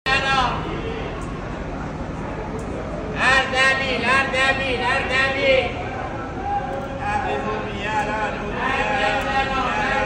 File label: Bus and passenger; داد و بیداد موقع مسافرزدن